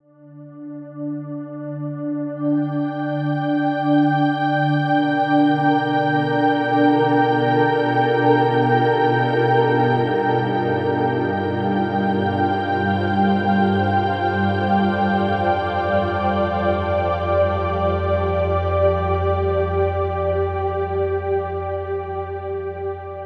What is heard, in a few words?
Strange Planet